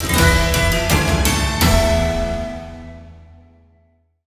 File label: mel mid win; netent